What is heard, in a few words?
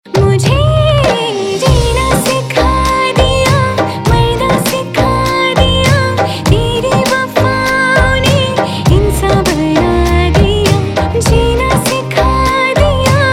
Female Ringtone; Bollywood